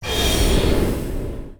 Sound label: double edge layer; weapons; dota; centaur